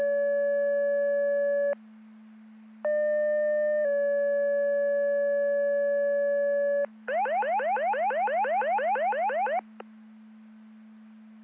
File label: Siren; Andover Twp Boro